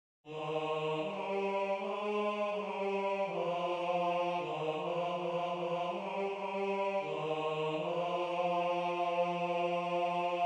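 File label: baritone, barbershop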